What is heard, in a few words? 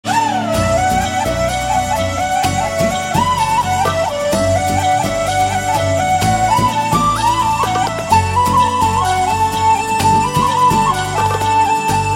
Flute Ringtones